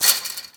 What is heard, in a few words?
Fence Chain Rattle; Foley - Rattles